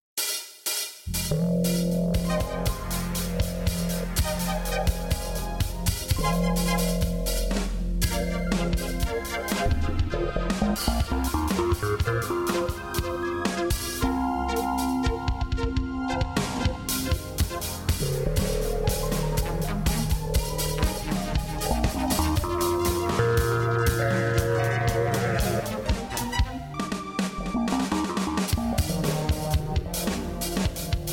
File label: drum; Kurz